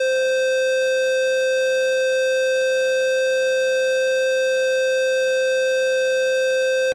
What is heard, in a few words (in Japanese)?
ハンマー長い音(C･ド); 锤子; ハンマー状態スターターセット